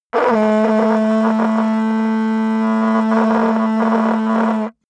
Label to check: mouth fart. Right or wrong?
Right